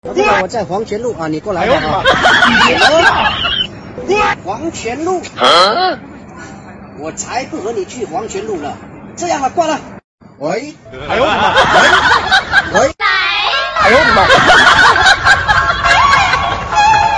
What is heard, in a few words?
laugh; breadradio